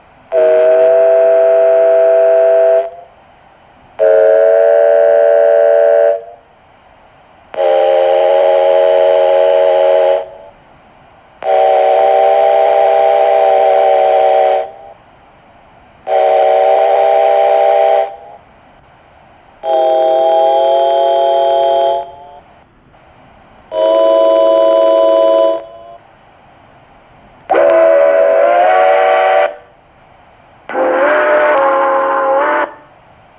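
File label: north american whistles, locomotive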